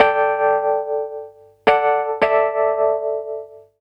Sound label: keys, Roots Funk; USB Soundscan vol.41; AKAI